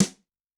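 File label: Brush H, Yamaha DTXpress-II snare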